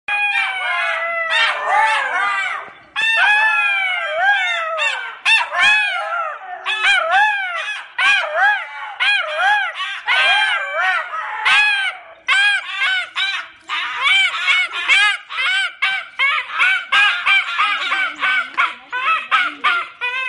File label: puppy crying; pilla ki awaaz